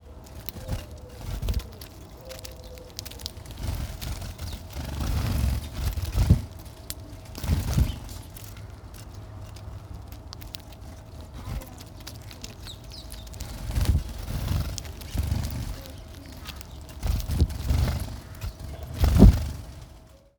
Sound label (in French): moineaux